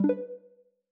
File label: error